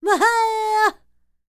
Vocal Planet World Voices, NOMADIC

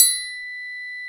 triangle, Yamaha TG-500

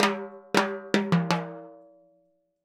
Timbali, Latin Percussion; Sonic Foundry (Sony Creative Software)

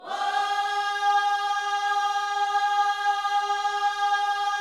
WHOA G#4, Vocal Gospel; ILIO; Vocal Planet

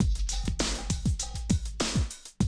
drum loop, 100 BPM

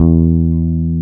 N BASS E2 ON, bass guitar; AKAI S6000 CD-ROM - Volume 1

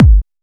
Trance Kicks, Bassdrums

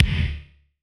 Kick, Gated Reverb; musicradar

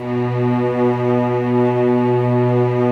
STR, Vcs p Amb

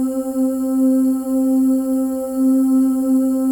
OO, FEM OOS, Voices; Spectrasonics

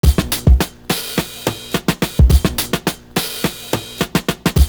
BUZZ FILL; Univers Sons - Kitch 70s

